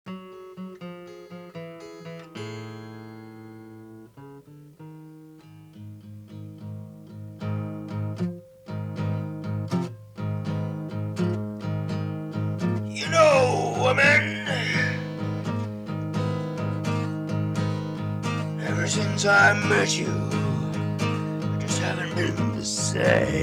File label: blues